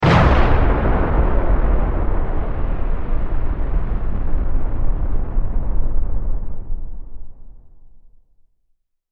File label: Science Fiction Explosion; Battle; SFX Bible